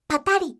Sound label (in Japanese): patari